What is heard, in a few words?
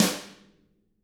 HIT, Drums, SNR; CAMCO